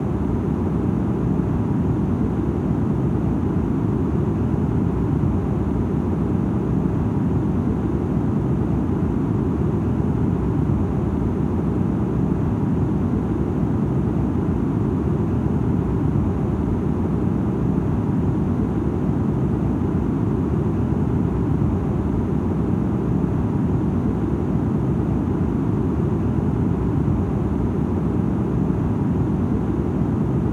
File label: White Noise, A320 Cabin Interior